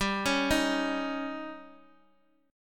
Db Suspended 2nd Flat 5th

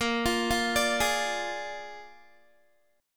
A sharp 7th A sharp, Guitar; A#7; arpeggiated; 17,20,17,19,20,x

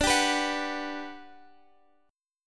G Major 7th, D

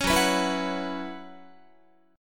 F sharp 7th Suspended 4th, Guitar; F#7sus4 strummed; 16,14,14,14,15,17; 4A,4E,5A,5D,5G#,6C#; chord shape

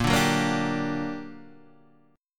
G Minor Major 7th, A sharp, guitar chord

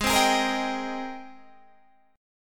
Ab Major 9th Ab down; AbM9; strummed

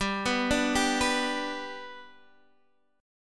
G Major down Guitar Half Step Down

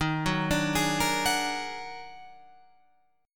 D sharp Minor Major 11th D sharp, Guitar Open F; arpeggiated

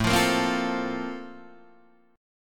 Bb Major 7th Suspended 2nd

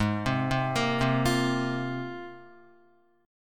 Ab 6th Add 9th Ab, Guitar; arpeggiated; JGuitar

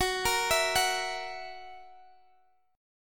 F sharp 7th, Guitar, Half Step Down

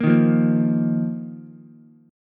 Bb Suspended 4th Bb down Ukulele; Bbsus4 strummed

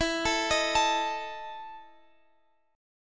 E Major 7th Suspended 4th E, Guitar, Standard; arpeggiated; capo 2; x,x,14,14,16,17

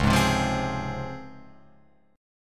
C sharp Major 7th C sharp Guitar Open F; C#M7; strummed

